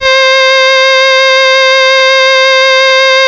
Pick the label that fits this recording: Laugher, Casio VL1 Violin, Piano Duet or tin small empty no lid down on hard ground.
Casio VL1 Violin